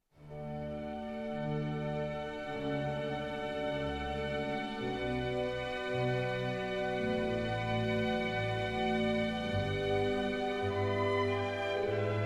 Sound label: orch